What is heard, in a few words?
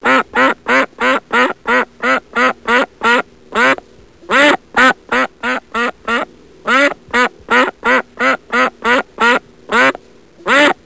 Excited Duck; Animals; Soundsation